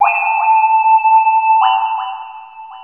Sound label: FLUTE, 168 BPM; Ueberschall; Drum & Bass Flash Trackz